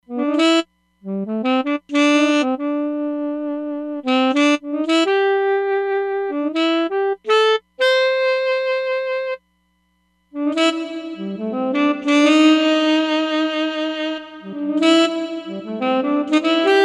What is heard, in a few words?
Sax, Roland DEP-5; Factory Patches